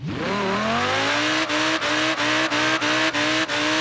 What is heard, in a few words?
rev, vehicles, audi v10; autos v3; skyautos